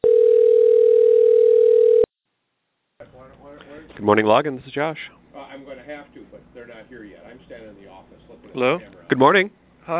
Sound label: audio delay